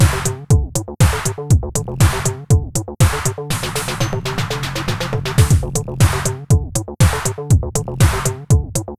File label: tech loop